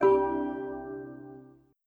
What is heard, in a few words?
Bing; Xbox 360 dashboard